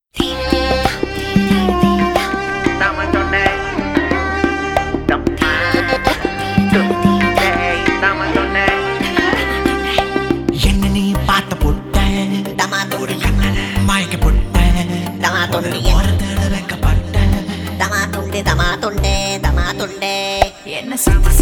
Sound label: Music